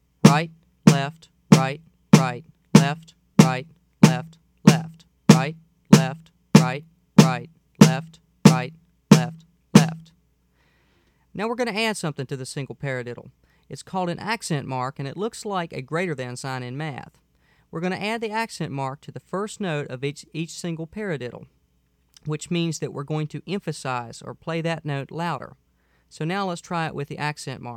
Rudiment 2

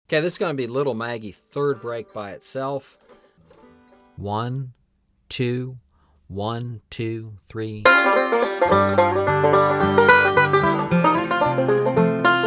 110 Bpm

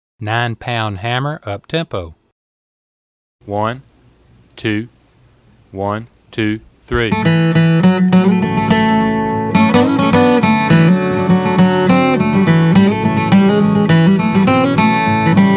Nine Pound Hammer Fast

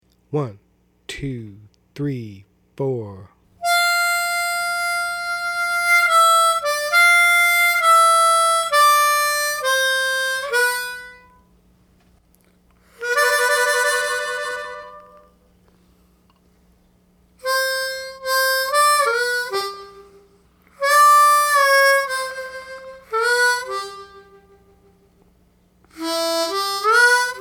Basic Blues Slow

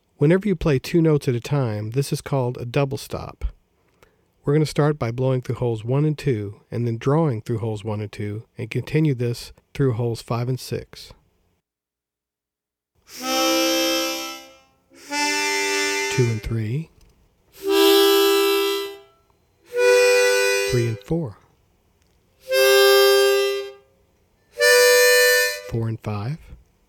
Double Stops

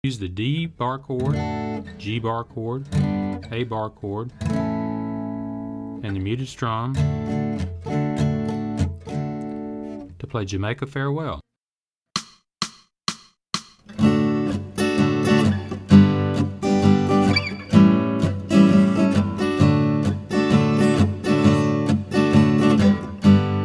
Barre Chords

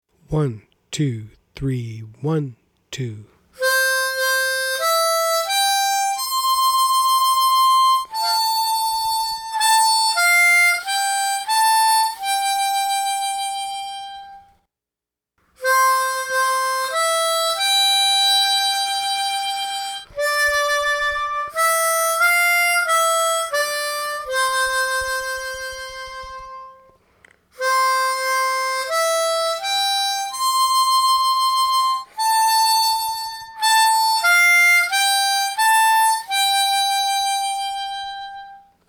On Top Of Old Smokey Slow

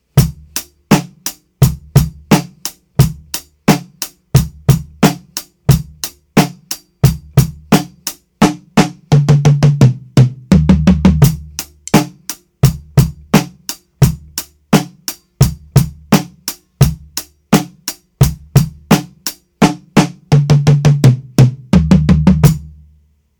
Beat 2 With Fill Fast

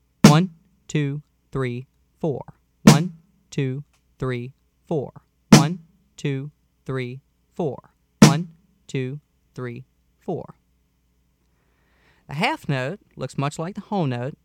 Whole Notes

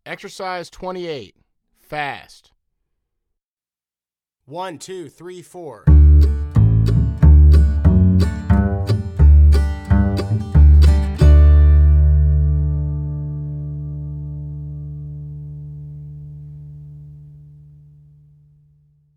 Exercise Fast

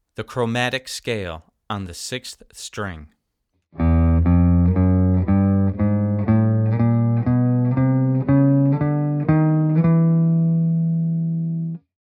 Chromatic Scale

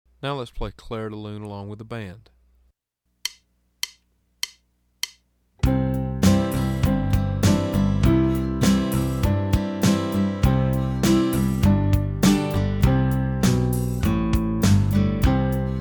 Fast; Clair De Lune

